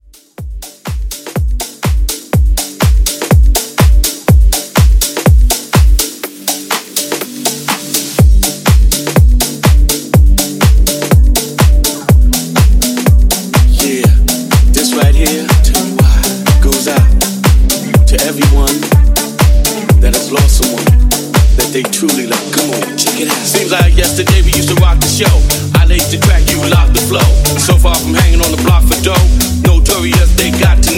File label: Afro House Original Mix; Remixfreakz